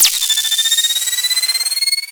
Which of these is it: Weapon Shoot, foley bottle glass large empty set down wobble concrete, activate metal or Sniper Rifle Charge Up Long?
Sniper Rifle Charge Up Long